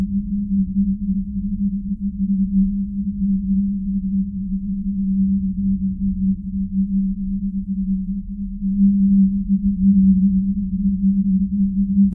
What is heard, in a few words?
drone, ambient drones; Left 4 Dead 2; Source Engine